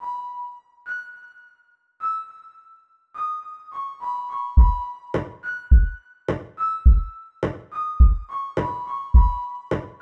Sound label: Sinewave